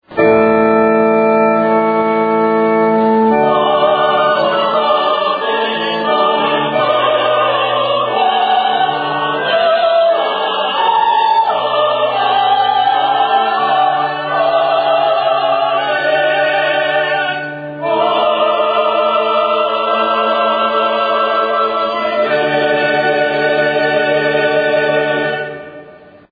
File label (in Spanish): amen sexteto; coros solistas; haendel